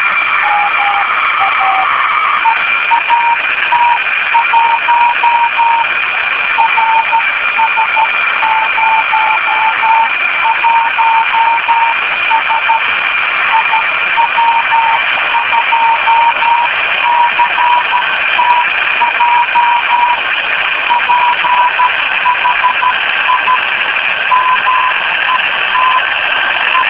145mhz915; ARISSAT

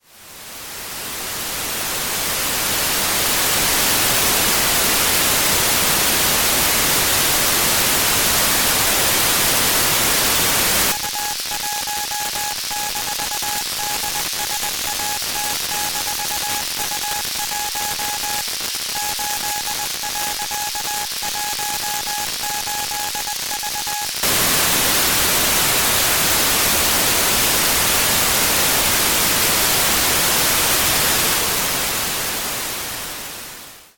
FM CW; AIRSPY

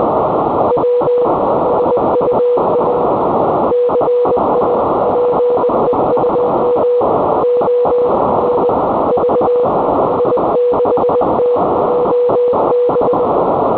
CW in FM; Cape2

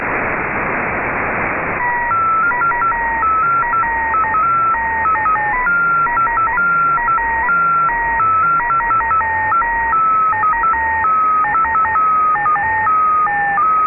CW; TURKSAT

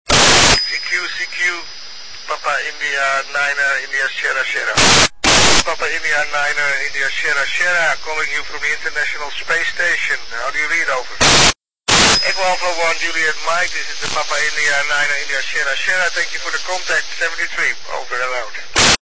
145mhz800; iss

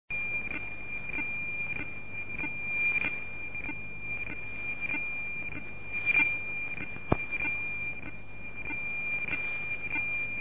beacon fm

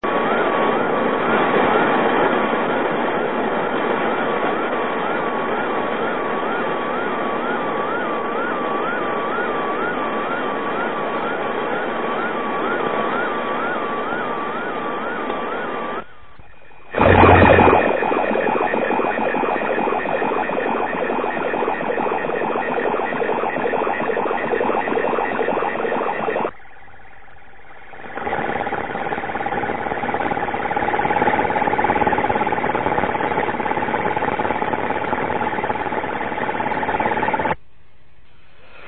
echo

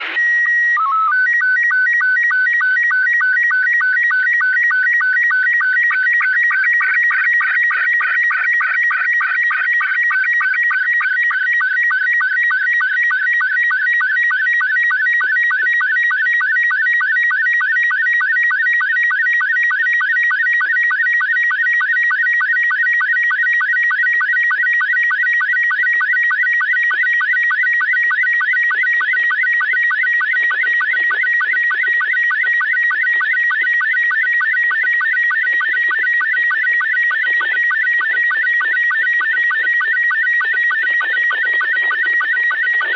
SSTV pic of the moon, satellite; Orbicraft-Zorkiy; During one of the SSTV events which took place from May 7th 2023 to May 13th 2023; Igor PU4

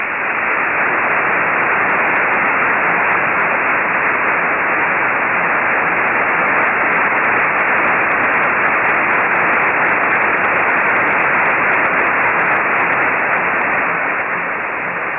xw-2e gmsk beacon 145mhz886

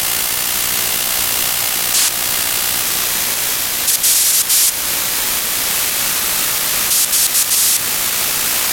9k6 BPSK (USB), 8x beacon; FengMaNiu (FMN-1); 435,350

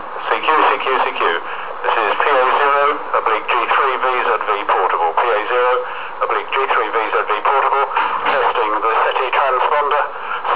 Transponder Test; SSETI Express

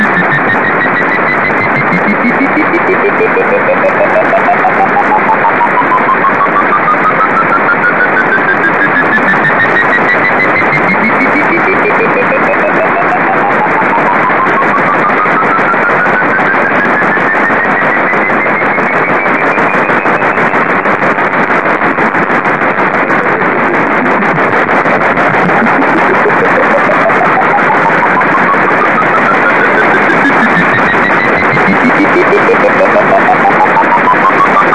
proba1 2235MHz

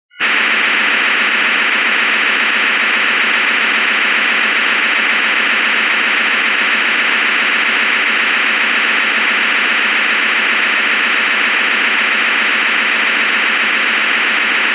Inmarsat3F1 on 1539MHz390

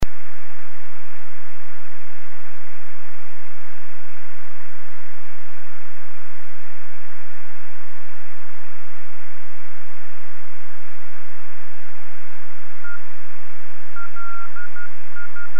beacon

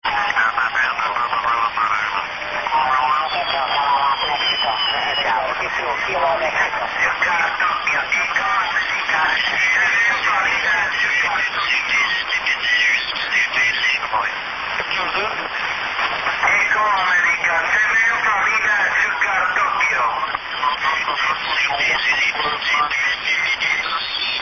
2m downlink; RS-13; Hermenau

